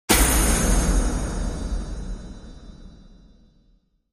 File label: Hit